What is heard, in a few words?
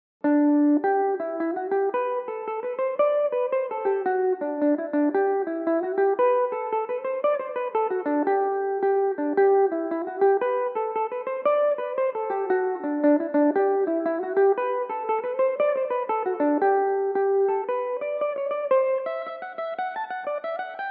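G major belmuse, irish mandolin; little bridge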